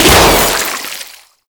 Balloon Pop; Festivities; Warframe; Follie

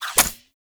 Pump Shotgun Reload Shell D; Weapons; Warframe; Zariman

